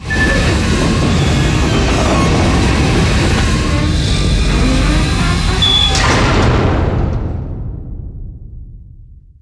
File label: door close; DAoC